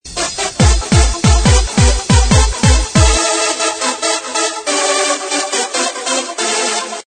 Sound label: Flanger